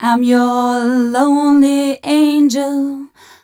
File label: f 132 mel, Vocals, fem